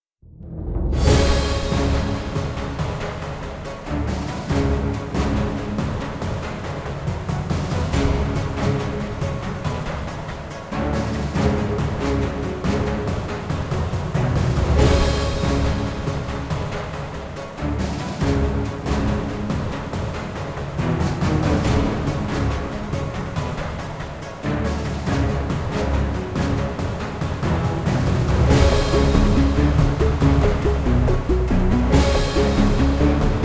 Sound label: Battle (Low); mass effect 2; Starport